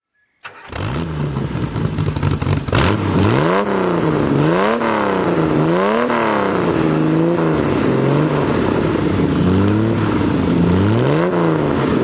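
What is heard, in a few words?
tr250 tuned throttle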